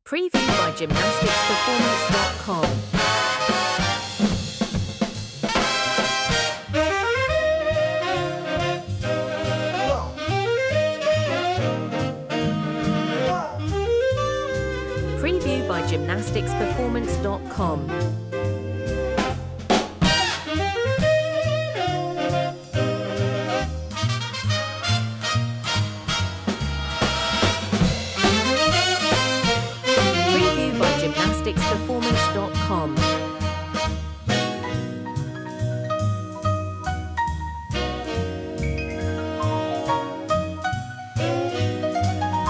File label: big band